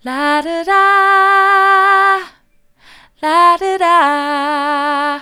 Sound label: la do da soft, vocal